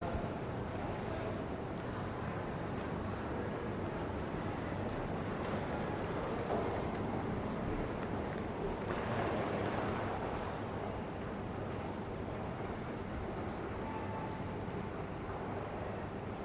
piccadilly train station ambience crowd manchester uk